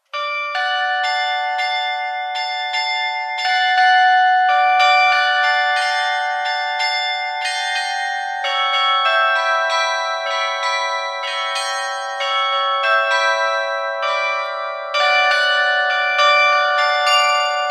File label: Glockenspiel; Donauwalzer; GRASSMAYR